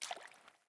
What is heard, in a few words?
Boot Walk Water, Footsteps; Characters; Enemy; Boots; Sinecure; Melee Grunt